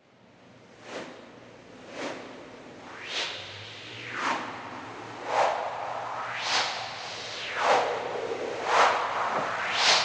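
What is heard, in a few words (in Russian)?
обработанные взмахи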